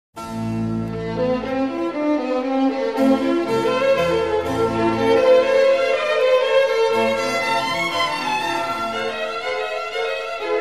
koncert dlja fleyty skripki i klavesina re minor allegro; BWV 1044